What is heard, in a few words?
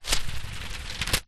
household baking grease proof paper unroll roll out on ground